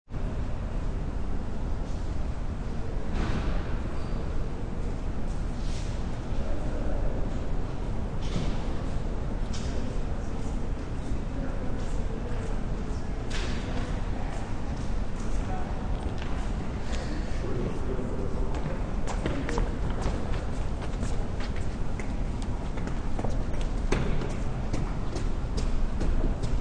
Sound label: Msm Amb, Background ambience of a museum setting; Buildings